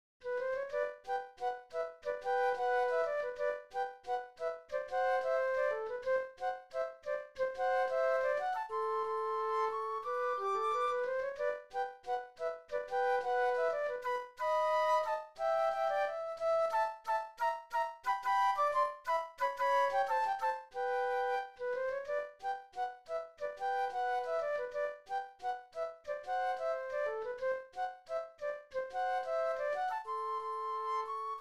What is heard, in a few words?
Rag Flute Duet; classical; Joplin; Peacherine